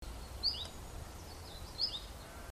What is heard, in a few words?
sweeoo variant